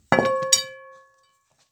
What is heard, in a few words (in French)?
cloche couvercle qui sonne bien